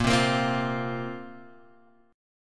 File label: A sharp Suspended 2nd down Guitar Open G